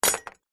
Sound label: industrial tool metal spanner or similar set down hard; zapsplat